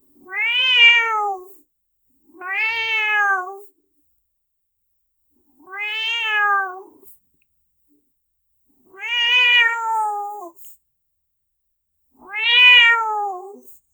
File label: realistic meow of a domestic, domestic animals